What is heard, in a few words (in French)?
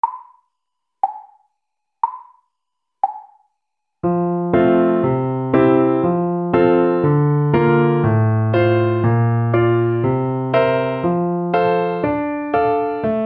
Fa Majeur